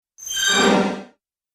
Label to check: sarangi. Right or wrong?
Wrong